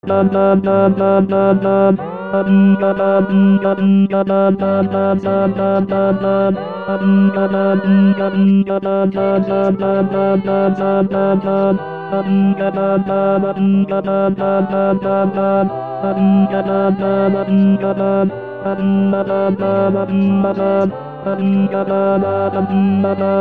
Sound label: tenor